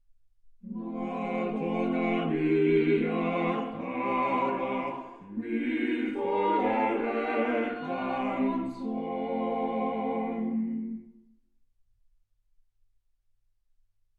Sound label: quartet; single mic